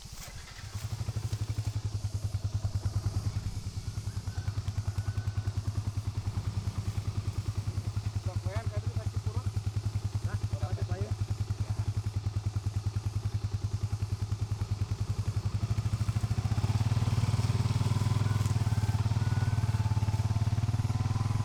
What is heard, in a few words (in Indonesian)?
sfx traktor sawah